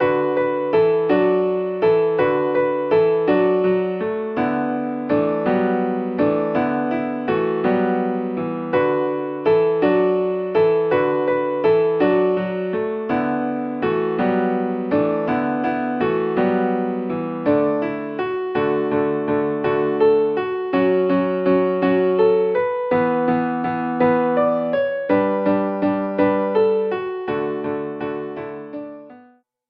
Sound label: accomp; hymnary